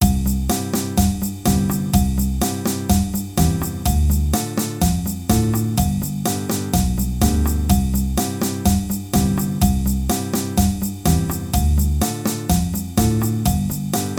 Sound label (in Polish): Cha cha perkusie